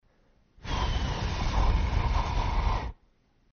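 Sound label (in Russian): ohlajdaet chay, cooling tea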